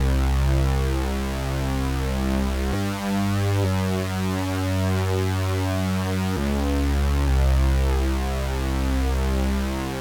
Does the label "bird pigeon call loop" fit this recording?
No